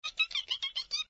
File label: rabbit long; toontown-ceiling